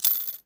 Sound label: Perc Chamber Spin; Drums; Misc Percussion